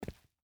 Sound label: footstep boots concrete walk; Rust